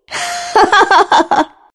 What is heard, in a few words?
laugh; Enchantress